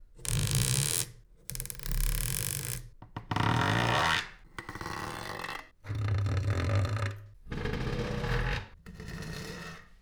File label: scale